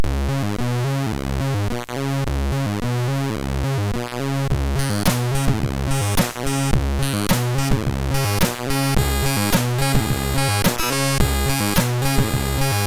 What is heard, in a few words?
intro, sid MOS6581R3; Mermaid